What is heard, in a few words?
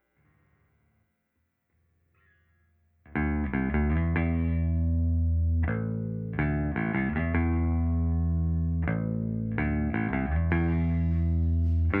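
M201 mono Bass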